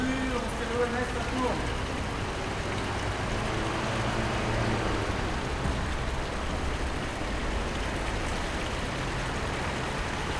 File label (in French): Amb Ext Rue Lyon Fontaine; french; City